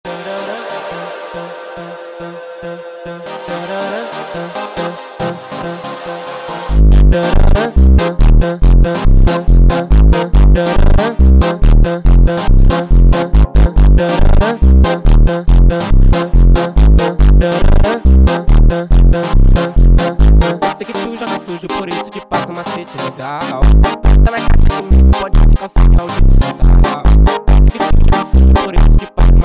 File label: Funk